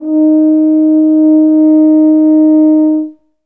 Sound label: tuba D#3; Best Service ProSamples vol.51 - Classic Orchestra 2